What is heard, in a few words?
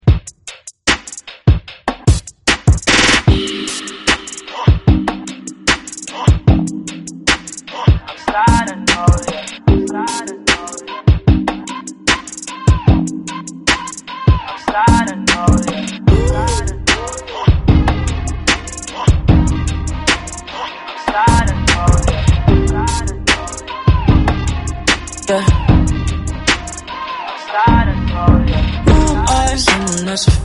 Sad Hits Mix